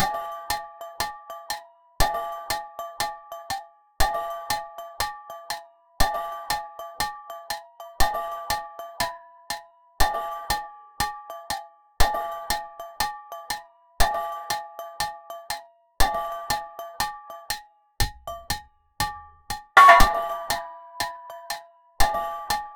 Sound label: drums